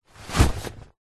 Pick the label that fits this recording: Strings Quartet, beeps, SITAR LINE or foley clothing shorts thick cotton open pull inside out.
foley clothing shorts thick cotton open pull inside out